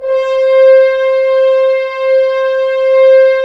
Horns; Roland - LA Composer vol. 4

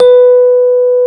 electric pianos; Wizoo Electric Pianos

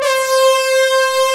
LINEAR SYNTH

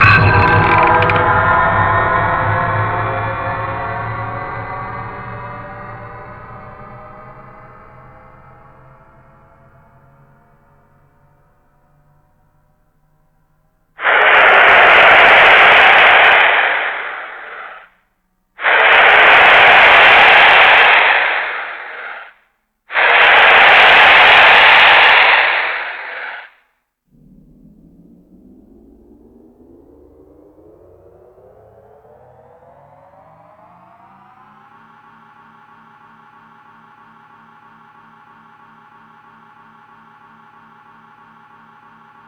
FX Sounds, Drum Machines

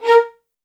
MED ATT A#, soft violins; Q Up Arts; Denny Jaeger Master Violin Library

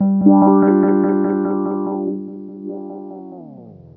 Rhodes Winddown; Mo' Phatt; Digital Sound Factory Mo' Phatt

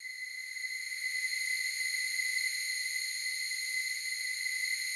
slow strings C6, Yamaha VP-1; 90 s Sample CDs; Music Store

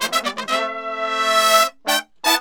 120 AM L, BRASS SAMBA; Best Service ProSamples vol.14; AKAI

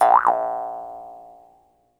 Jaw Harps

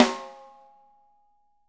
WOOD, Living Drums; 6X12

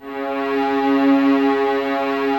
violas C#3, String Ensembles; Miroslav Vitous; VLS 1+2

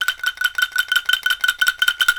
F#5 anklung, Java anklung; WorldSounds

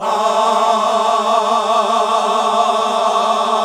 GOSP. AAAHH

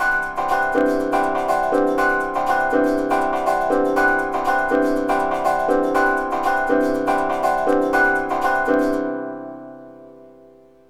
Trance SYNTH SEQ, 120; AMG; Global Trance Mission VOL-2

